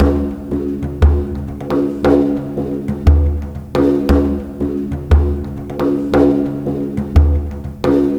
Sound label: bodhrán 88 bpm